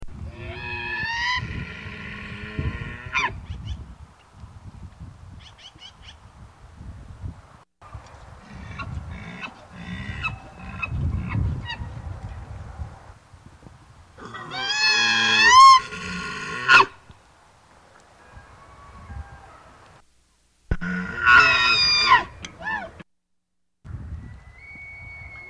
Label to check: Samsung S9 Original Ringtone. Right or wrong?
Wrong